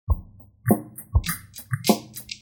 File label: combo drum